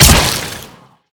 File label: frost bolt